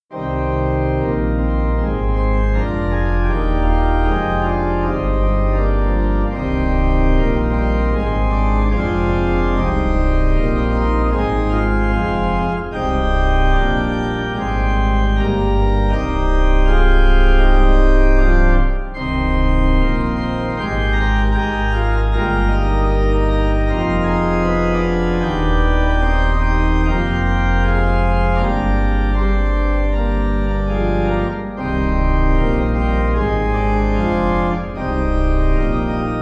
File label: Kyrie Pipe; Communion; Dudman